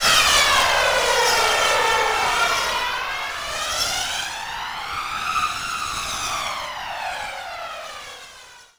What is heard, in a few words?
Rocket, Flyers; Zero-G Seismic Frequencies